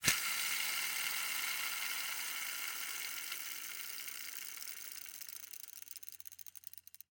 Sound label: Rollerskates, old fashioned, all metal, wooden wheels, swipe, spinning, slow to stop; Eiravaein Works; Vaeyan IV